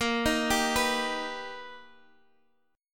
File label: B Augmented Major 7th Bb, chord